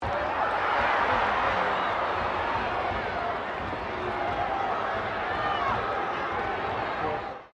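crowd 15K Rugby World Cup cheer low, Rugby world cup game; Crowd Sound Effects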